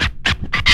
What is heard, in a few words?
SCRAT; E-Lab; X Static Goldmine 2; akai